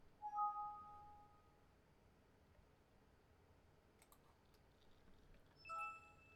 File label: Computer Shutting Down